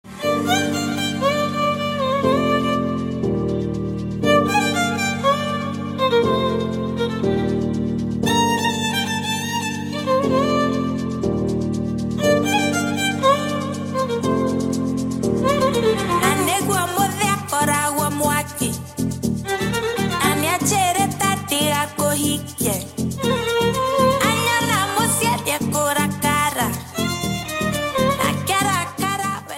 violin ringtone; android

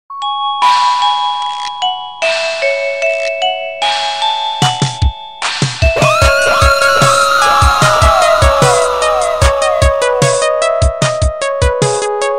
horror ringtone; android; airtel

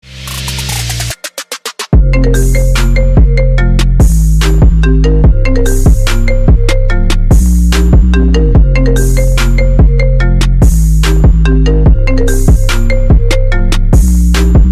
iphone remix ringtone

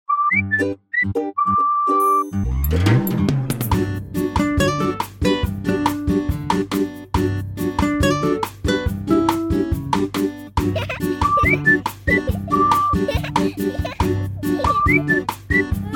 relax ringtone

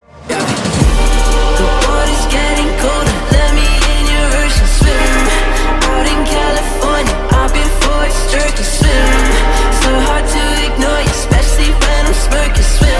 swim ringtone

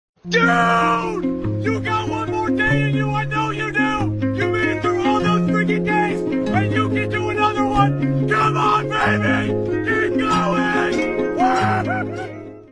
motivation alarm ringtone; android

